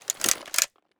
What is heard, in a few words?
sg556 draw, weapons; Counter Strike Model Pack; CS2